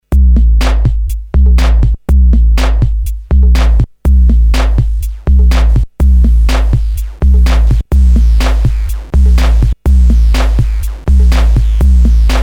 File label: Korg DSS-1; main core based on 8 bits CPU Intel 8085 manages 8 voices polyphony; lowering from 12 bits to 6 bits